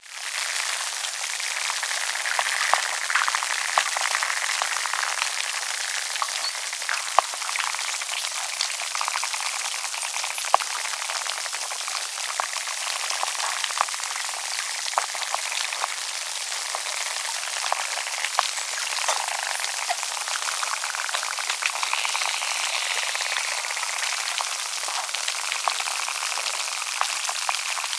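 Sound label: Dolphin Spinner Clicks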